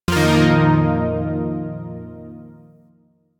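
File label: Shawzin Pentatonic Minor Chord; Zariman Shawzin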